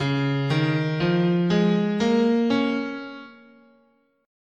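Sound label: D flat Major Pentatonic, guitar, scale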